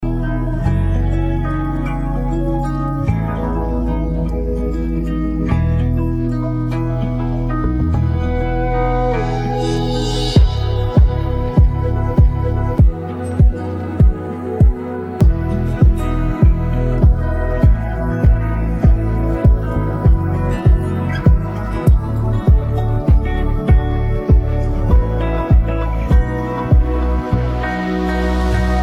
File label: Karaoke Song